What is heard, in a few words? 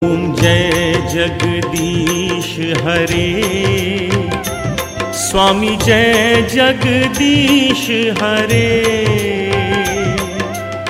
om jai jagdish hare